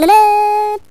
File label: tux butt jump